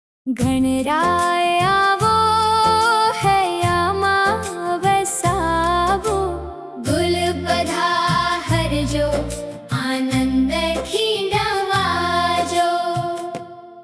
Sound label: gujarati ganaray aavo, ringtone; ganesh